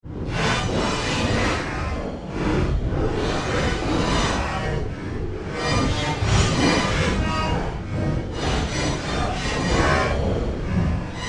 Soundscape Robotic Engineering, science fiction sound effects